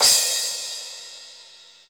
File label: soft hit finish